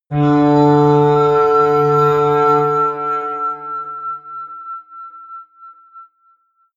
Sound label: atmospheric feedback synthesizer medium, drones